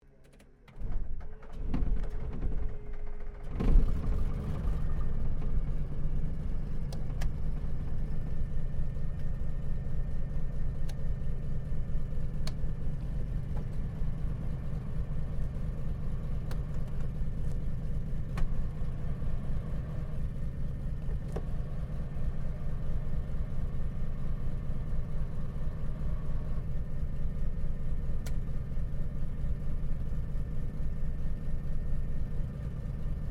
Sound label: AIRCRAFT CT4A STARTUP INTERIOR, military trainer aircraft; Airplane Sound Effects; Airplanes (Sound Effects:Vehicles)